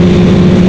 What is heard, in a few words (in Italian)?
Veicolo, motori